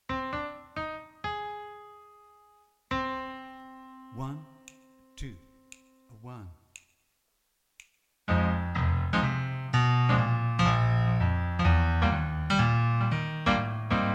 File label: inst jazz; Autumn - Inst. jazz K